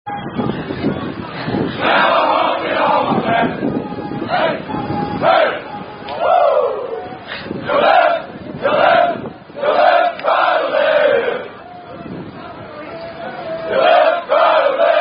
cadence

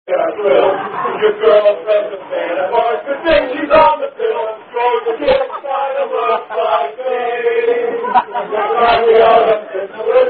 Rugby Song; Unknown Rugby Song, Tune John Brown's Body

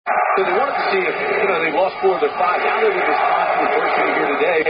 Football Chant; Hilarious Mu Vs Ku

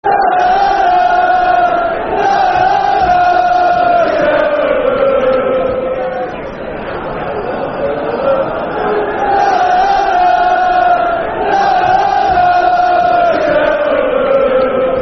Lala Cheltenham, Football Chant; Soccer Songs; Cheltenham Town Ctfc

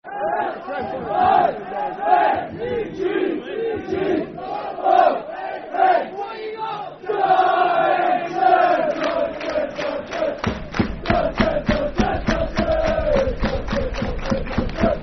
Spell It Out, Football Chant (Soccer Songs); Darlington Dfc

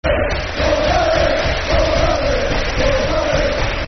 Football Chants (Soccer Songs), Cardiff City

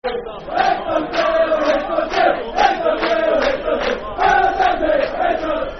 Football Chant, Dundee United; We Hate Dundee; Dundee United Dufc

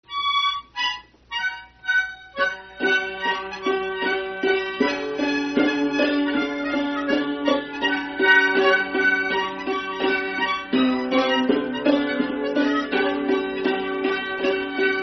Dance Tune; Unamed Tune

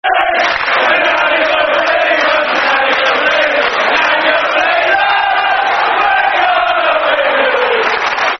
Football Chants; Portsmouth; We're Gonna Win The League; Portsmouth Pfc

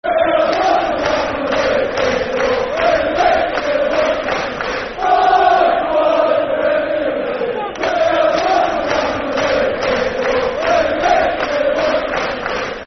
Football Chants (Soccer Song); Black Country; Wolves; wolverhampton wanderers

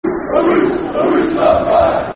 On Fire, Football Chant; Lloyd Owusu; Yeovil Town Ytfc; fanchants

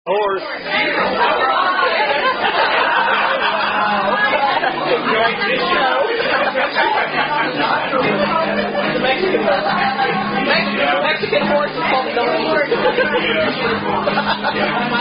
Drinking Song; Fuck A Goat Song; Post Sca Drinking Song

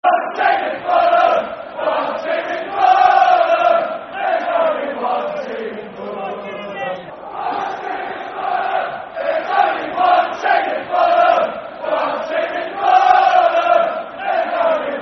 One Team In Fulham, football chant, soccer songs

